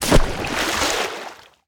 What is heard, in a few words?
splash